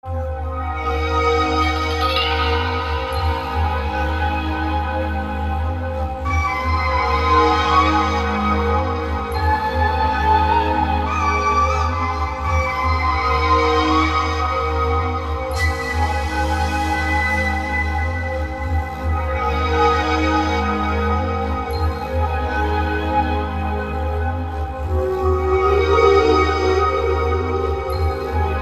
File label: melody